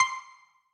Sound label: move